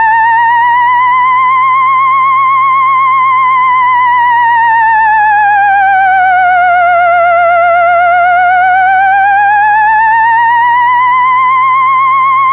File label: ufo, theremin